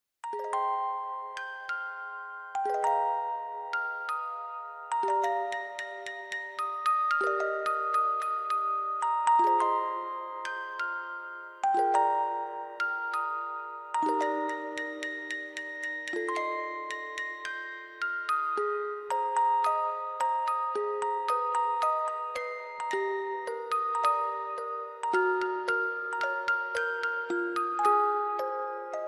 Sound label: music box ver.